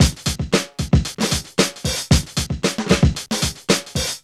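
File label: 114 bpm, Funky loops; Zero-G; Funky Elements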